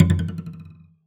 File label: Cello D Bass